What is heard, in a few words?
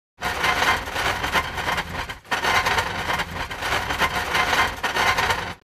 Metal trash can dragging sliding scraping